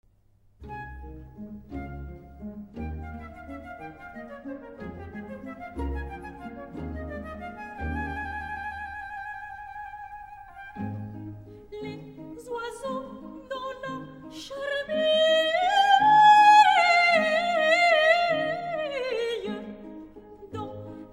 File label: soprano; Les oiseaux dans la charmille; Jacques OFFENBACH